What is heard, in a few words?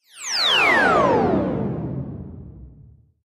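Descend Electric Whistle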